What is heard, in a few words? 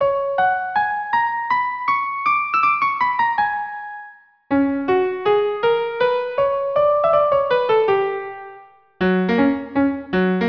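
violin viola cello; Seguedille; Carmen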